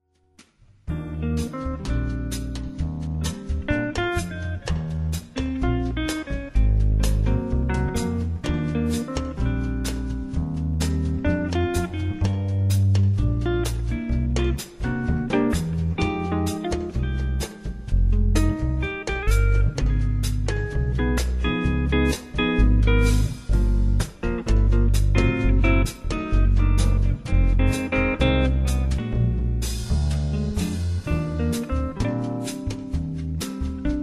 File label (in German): Trio bossa slow